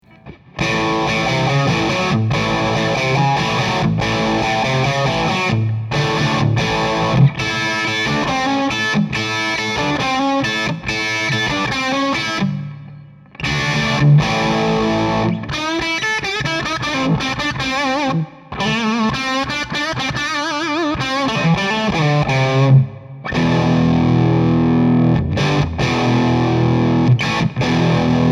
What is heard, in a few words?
Traynor M75&H75 More Bass